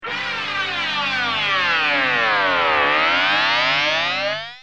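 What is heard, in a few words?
guitar sbf325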